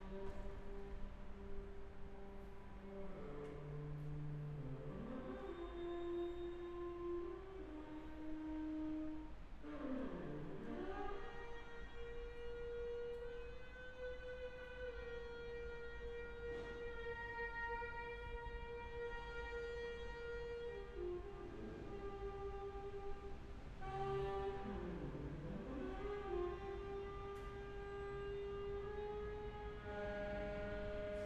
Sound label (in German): Saxofon; Zoom H 6; Atmo